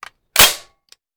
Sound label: Machine Gun Foley; Sound Ideas; Guns